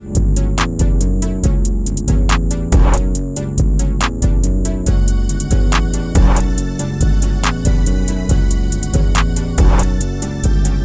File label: Vintage style music